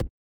transition glitch

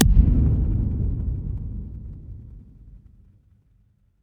magic scifi shield

